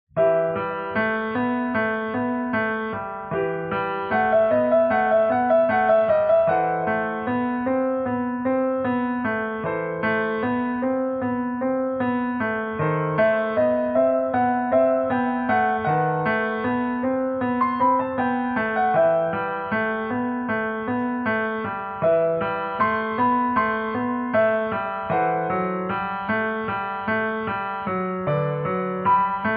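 Well Tempered Clavier Prelude and Fugue No 10 in E minor BWV 855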